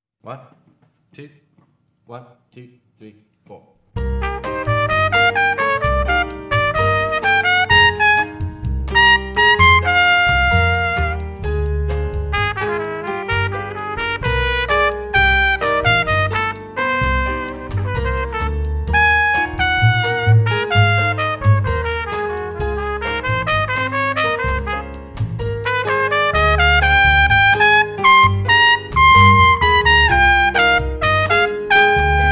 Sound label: latin etude lead trumpet